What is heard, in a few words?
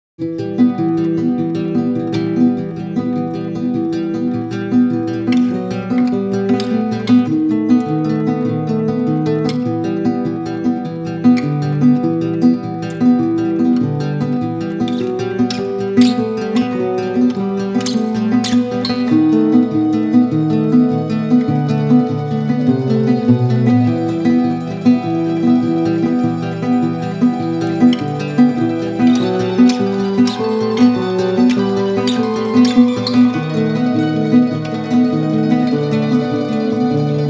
acoustic